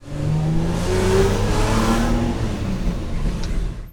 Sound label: run, cars; 1000fx